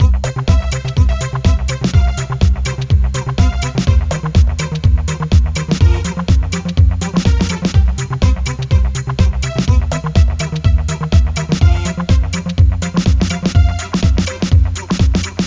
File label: Dance; Talking Pato; Android iOS